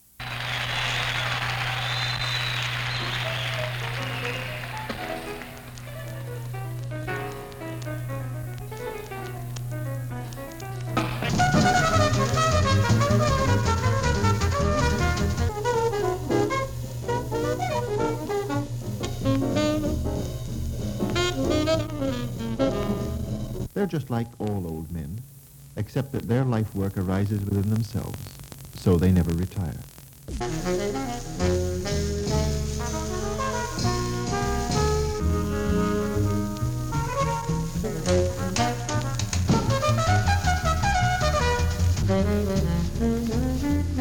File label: Loop 2 Channel Rythms